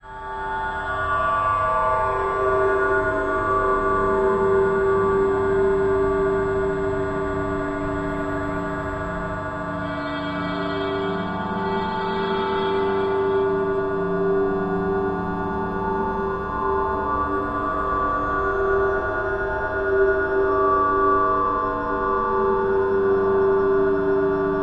Horn o' Plenty brassy hollow tones surrounded by steady electric hum